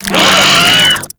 Desert Skate Vocal Pain; Warframe

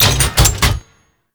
Sniper Mech; Kahl

